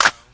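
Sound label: clap f key, Royality free hand clap tuned to the F note; percussion; single hits one shots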